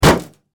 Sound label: Car Crash; teststrecke-game